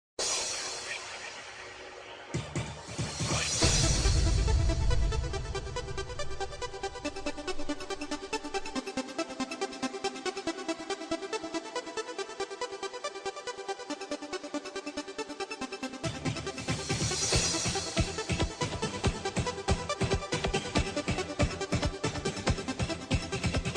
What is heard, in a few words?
Remix; Mortal Kombat